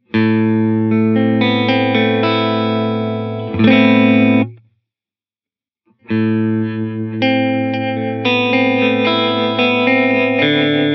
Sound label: Mod Trem Delay